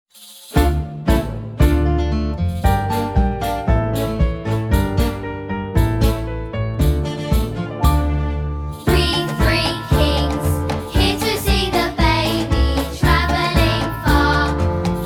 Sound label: we three kings vocals